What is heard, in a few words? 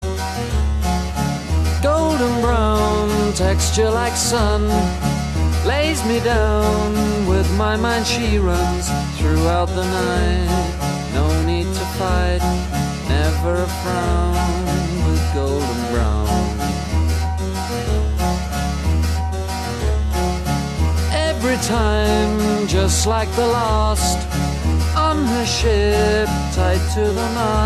Marimba